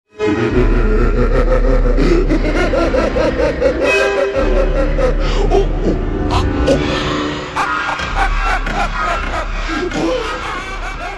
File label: laugh ringtone trance